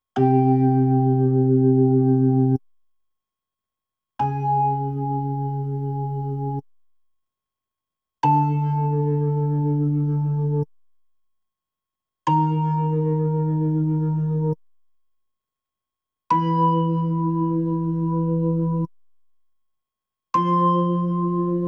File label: B3 Organ; Masterbits CLIMAX 8